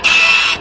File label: dot matrix printer; pocket calculator 24